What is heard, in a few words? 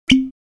Glass bottle finger pop